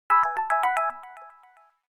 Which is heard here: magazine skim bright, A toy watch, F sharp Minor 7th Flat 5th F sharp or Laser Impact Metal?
A toy watch